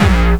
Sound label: bombo, kicks, Hardcore